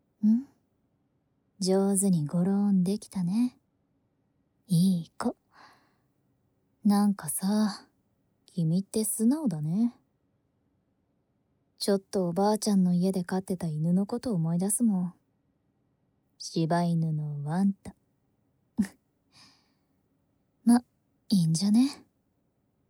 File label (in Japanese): 密室に閉じ込められて耳かき（左）, ASMR